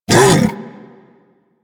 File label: Predasite Vocal Tranquilized Grunt; Warframe; Hunting Critters